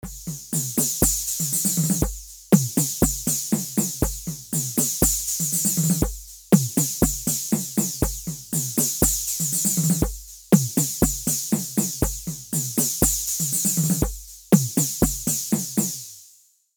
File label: Echo Drum Flanger; Ableton